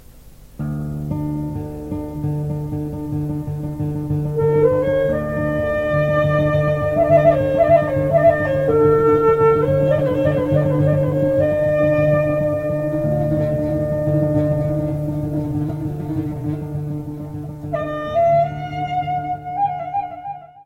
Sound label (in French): Syrto, Grece